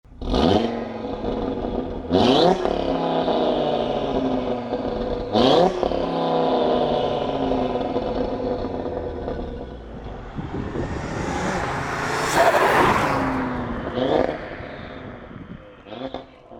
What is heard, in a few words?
audi rs3 tuned stage 3 modified; nardo grey saloon